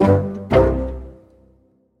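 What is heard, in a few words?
sounds with an effect of completion, ending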